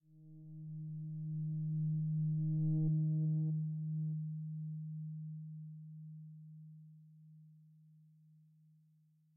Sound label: Lo Hum; Proteus